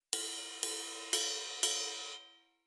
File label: Metal Ride, Rhythm